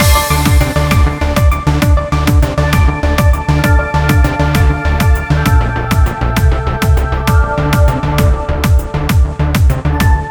Funk Outro; Funklift

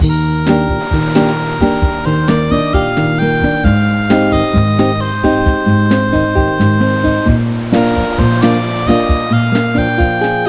Stage BGM; hichi and friends

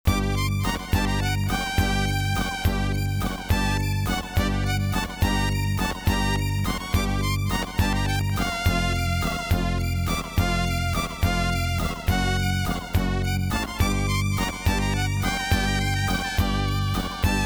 Waltz (In Labyrinth); Legend of Game Music Consumer Box; Fray

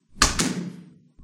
Door shut; Five Nights at Ronald's

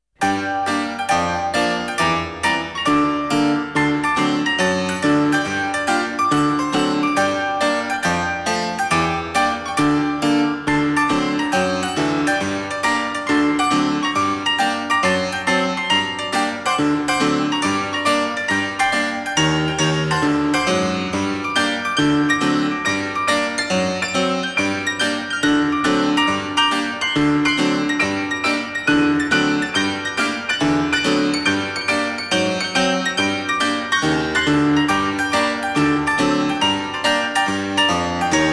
Piano mono; dead mans hand windows xbox gamerip 2004

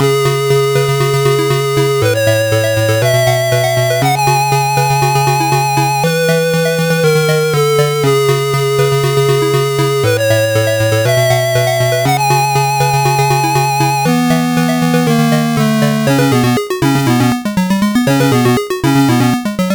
Stage BGM; R-Type; Master System

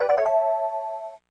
Confirmation SFX; Funky Head Boxers; Saturn

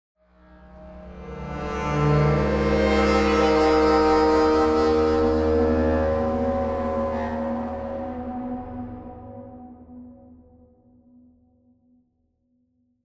Tension Mood Snare Stinger; the last of us

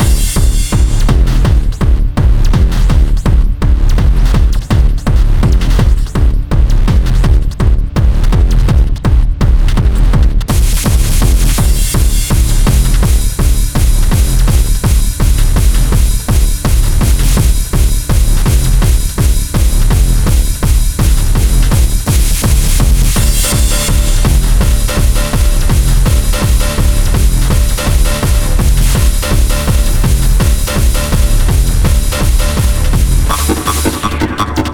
Nightclub Low Intensity; Bottom Dweller

